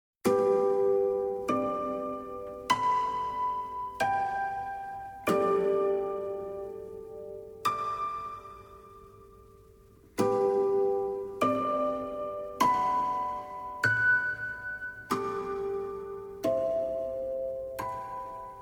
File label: harp